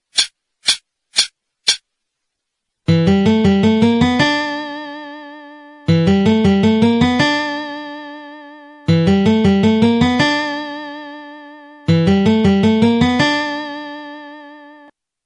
vibrato

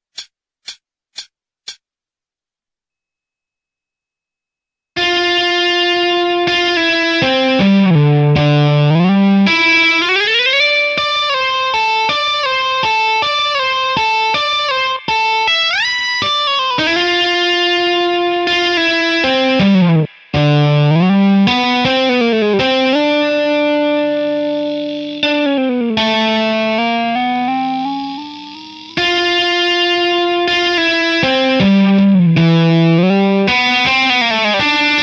solo 40

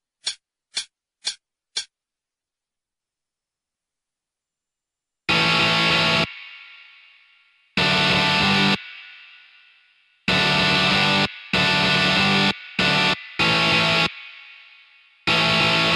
backing, 96

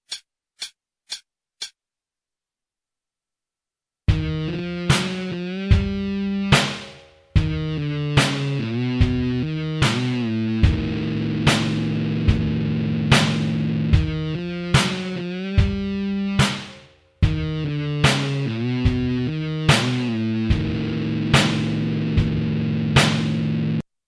solo backing, 73, guitar

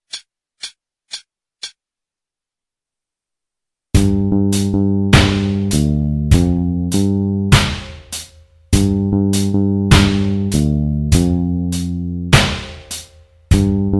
blues g riff backing 50

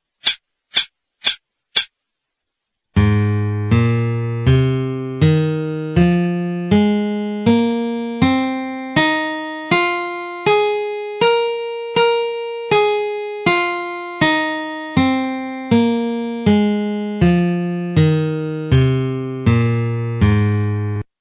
F Minor Pentatonic 80

